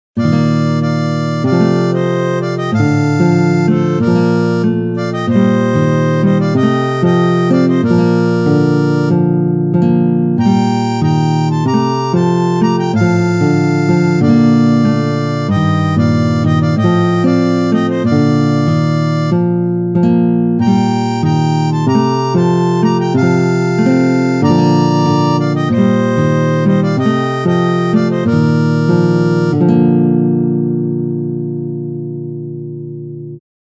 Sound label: Shall We Gather at the River Harmonica